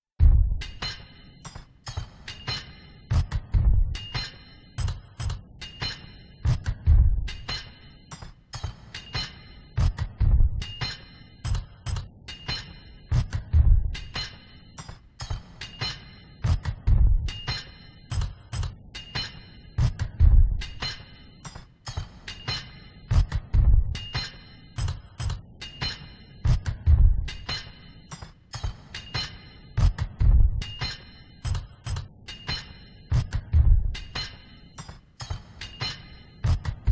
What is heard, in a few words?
drums